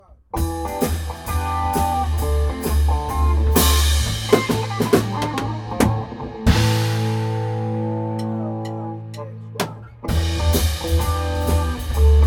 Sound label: Endings